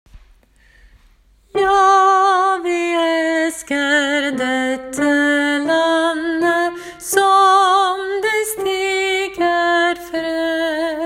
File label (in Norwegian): ja vi elsker sopran; åfjord